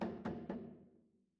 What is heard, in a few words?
door knock